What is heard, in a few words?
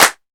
CLAPPER, HAND CLAP